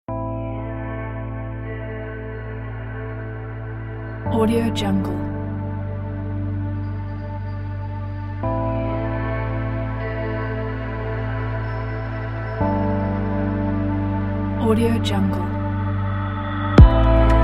utopian dreams

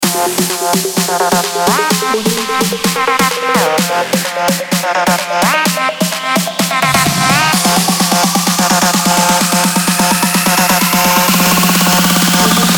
dancing

